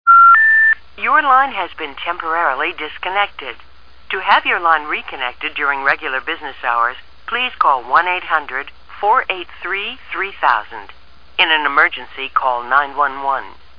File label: indonesian disco